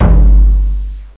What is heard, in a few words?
Taiko; Prince of Persia 2 Demo